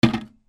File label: BATHROOM